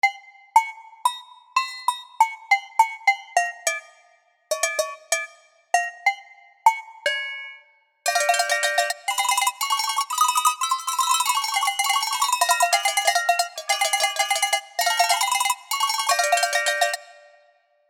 Alpine Volksmusik Alpenschellen

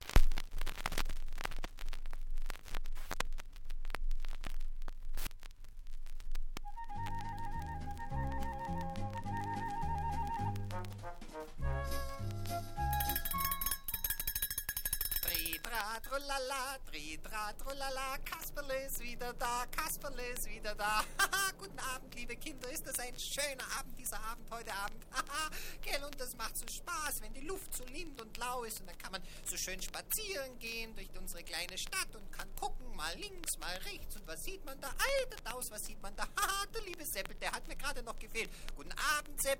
Alte Schallplatte unbearbeitet

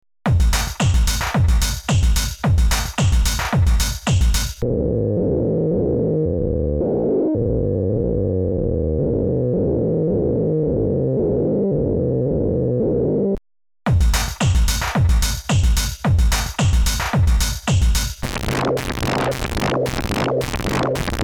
Drumloop moduliert VCO und VCF; KNIF AUDIO; KNIFIONIUM

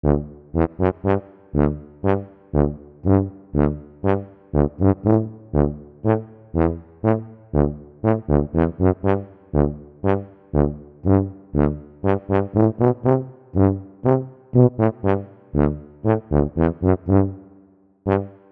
Alpine Volksmusik Posaune

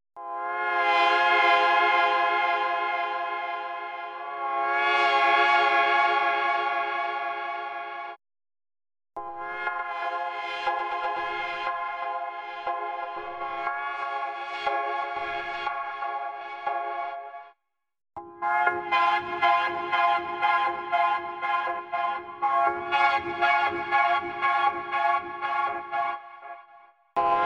Klangbeispiel Chords; UJAM FINISHER FLUXX

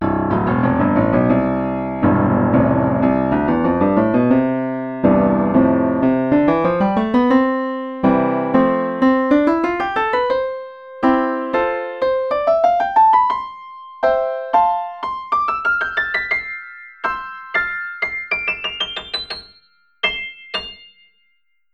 Lauf Upright; PianoV; ClassicU3

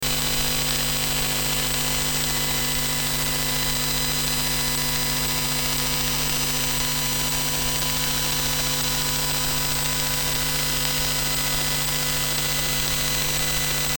CRAFTsynth 2.0 USB Noise im Batteriebetrieb

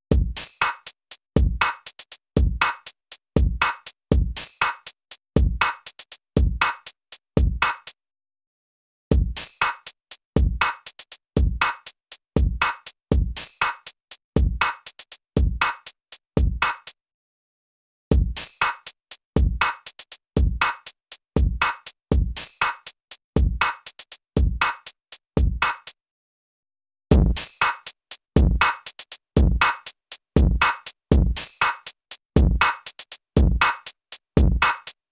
Klangbeispiel COMP TUBE-STA; ARTURIA

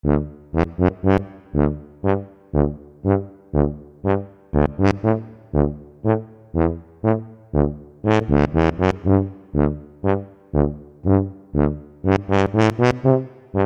Alpine Volksmusik Bariton

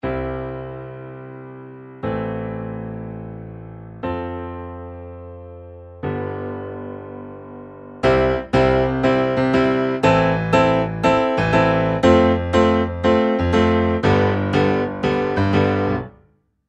Pop Rock Fluegel; Toontrack EZKeys

